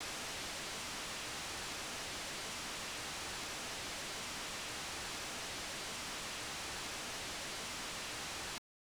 hiss; zombietest

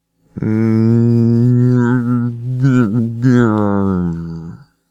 groan; zombietest

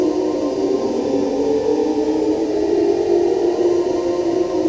portal initialize; Crafter; nether

